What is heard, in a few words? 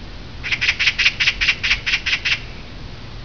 Magpie